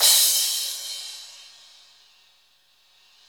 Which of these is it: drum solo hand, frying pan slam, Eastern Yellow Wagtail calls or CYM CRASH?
CYM CRASH